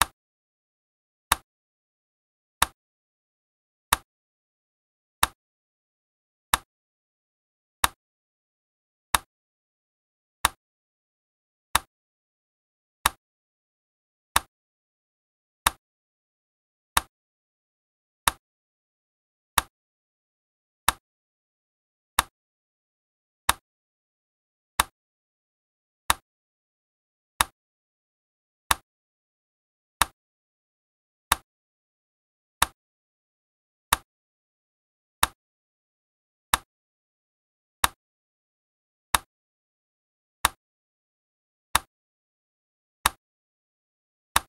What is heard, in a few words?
46 Bpm classic Mechanical Metronome